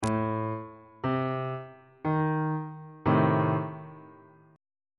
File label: a diminished chord; bass clef